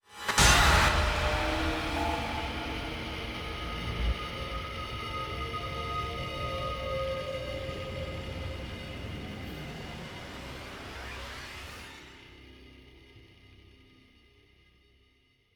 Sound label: shock riser; Joe's Diner